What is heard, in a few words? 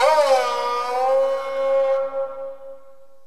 SARANGI TAB